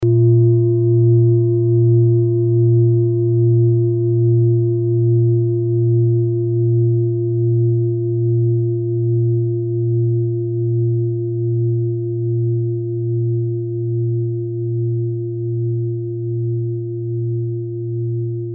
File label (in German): klangschale set; Klangschale Nr.3; Gewicht = 1980g; Durchmesser = 26,4cm; Höhe = 11,5cm